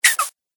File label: Bottle Air Squeak; Airy Sound Effects